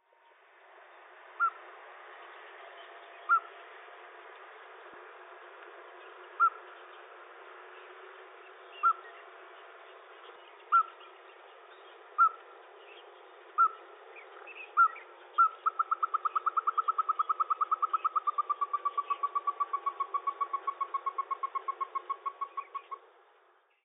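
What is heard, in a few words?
Ruddy-breasted Crake, Kin; リュウキュウヒクイナ; 沖縄県金武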